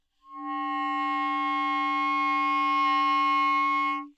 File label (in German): dreifuenfklang, multiphonic